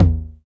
deep tom single hit e key, Royality free tom sample tuned to the E note. Loudest frequency: 200Hz; tom drum samples